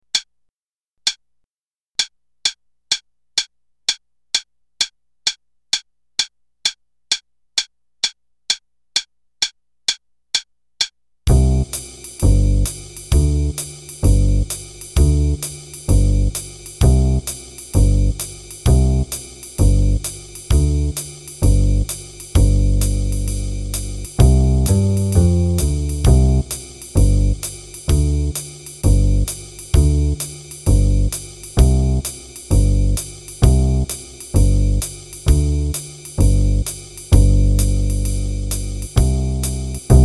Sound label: bass and drums, qn=130; Level 3A - page 41; Snowflake Rag